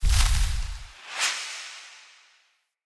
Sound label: Blind Cast; Powers; Fey; Saboteur